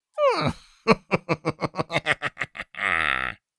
Sinister laughter little fantasy creature

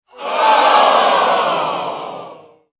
Sitcom crowd disapproval boo

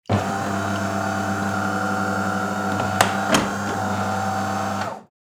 Printer starting up whirring noise; scanner and printer

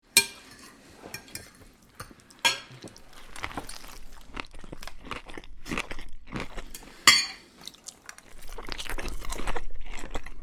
Dinner or lunch eating food with fork and knife

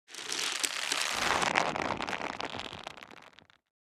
Military rope tension; Military Equipment

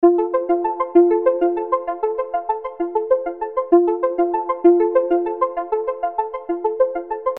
Infographic call ringing tone loop

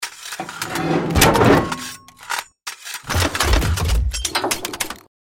Mechanical metallic sequence gears movement

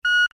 Metal detector single beep; foley

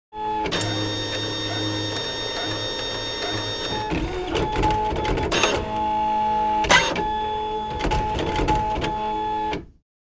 Epson inkjet printer starting up

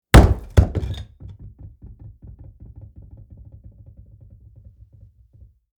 Big building block thrown on asphalt

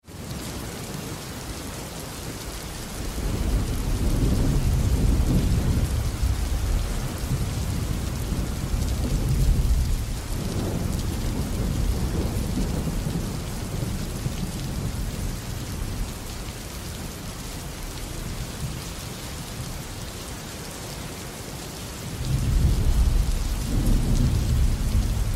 Urban thunderstorm with heavy rain

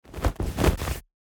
Jacket movement taking off; foley

